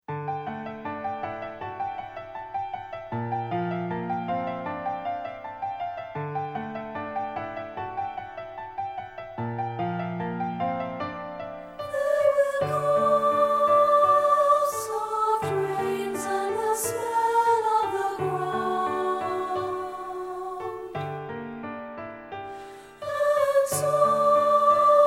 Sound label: VOX; twopart